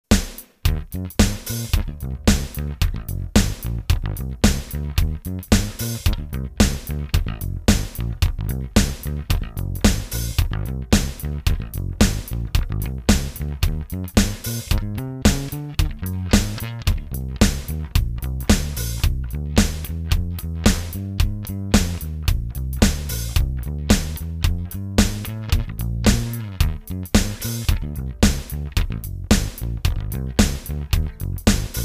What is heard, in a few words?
Sansamp RPM clean